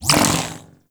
Link Beam End; Trinity

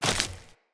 default step, steps; Characters; Ambient; Sprout Farmers

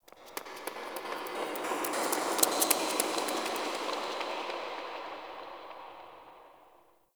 Memory Echoes; Ambience; Zariman; Warframe; The New War

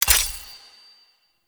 Collectible Fragment UI Pip; Warframe; Duviri

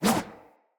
Sword Whoosh; Weapons; Swords; Garren Sword

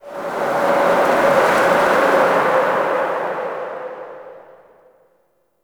Exterior Forest Wind; Ambience; Duviri

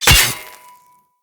Melee Axe Impact Robotic Flesh

